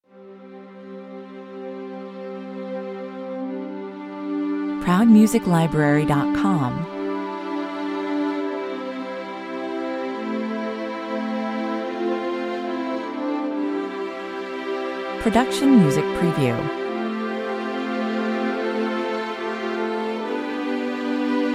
strings only

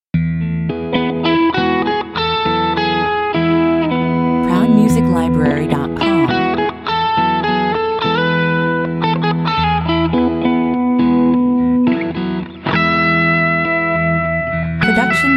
guitar 102 bpm

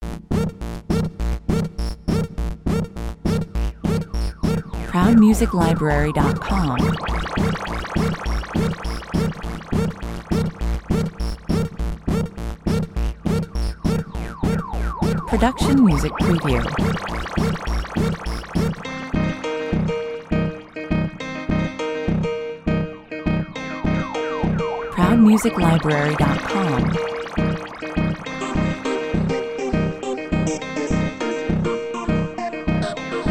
theme (bed, no drums) 102 bpm

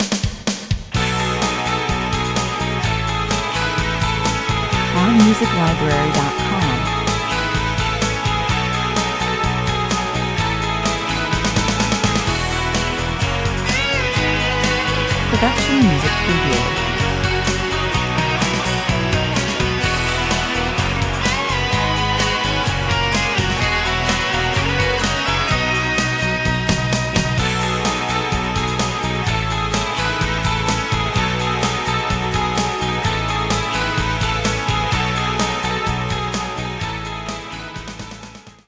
outside looking in 130 bpm

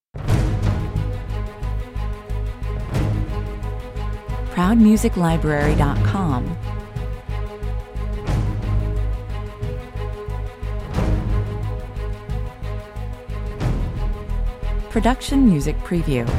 staccatos and percussion only

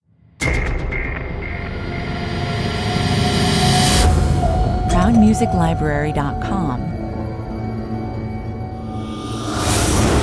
tension opener 120 bpm

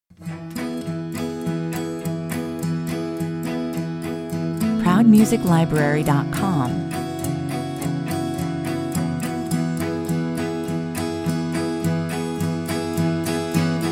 (no strings no piano)

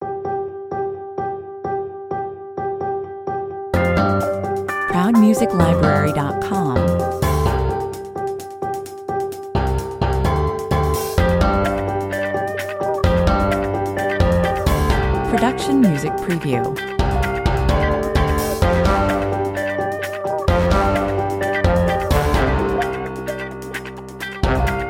brass

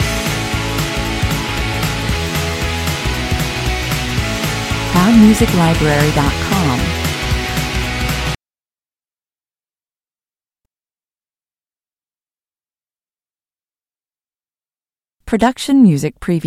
rock me indie (loop)

